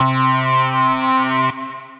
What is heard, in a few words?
Solo, Synth Lead; Gustav; Ableton; Glitch and Wash